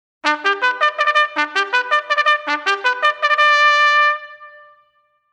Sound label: First Sergeant's Call, Marines drum corps